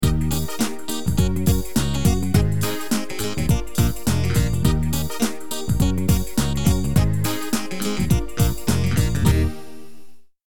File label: Boppy song